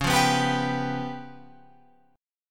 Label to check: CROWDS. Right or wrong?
Wrong